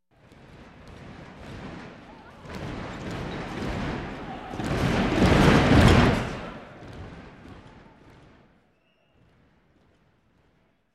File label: Arena Crowd Foot St D, sports arena